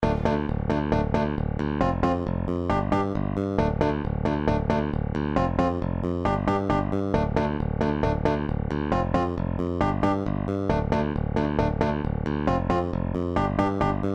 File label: Dungeon